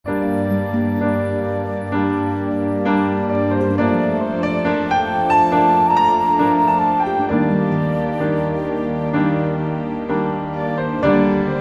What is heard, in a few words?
instr